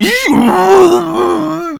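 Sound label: zombie die; garrysmod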